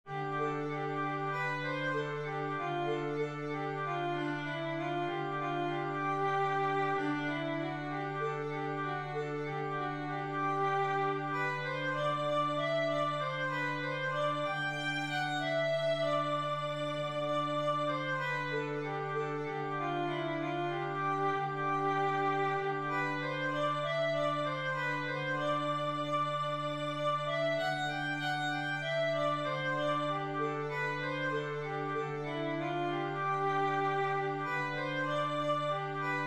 Columba Full

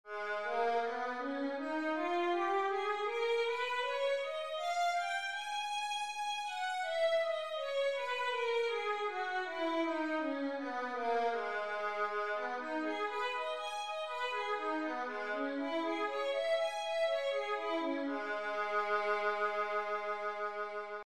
violin G sharp minor, scales 2 octave

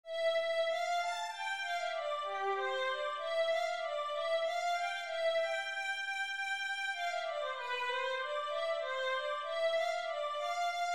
beethoven violin

